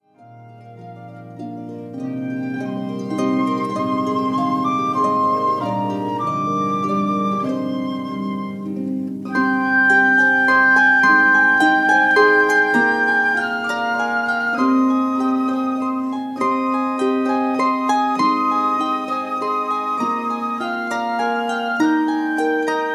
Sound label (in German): Herbstwalzer tutti